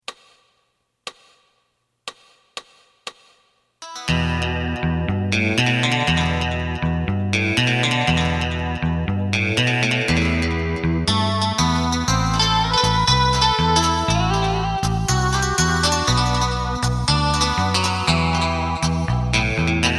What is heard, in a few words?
drum